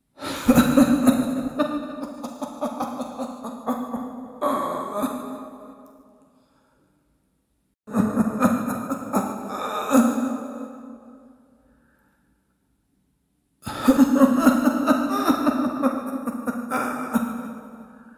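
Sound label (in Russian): old witch laughs in a dungeon; Для Хэллоуина; Коварный злой смех старой ведьмы, забавный смех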